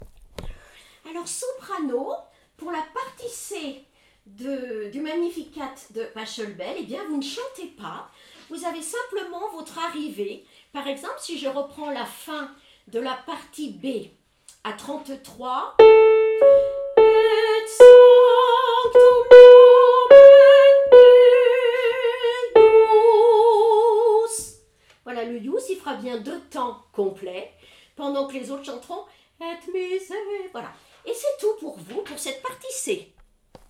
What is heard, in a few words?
Magnificat Soprano; Pachelbel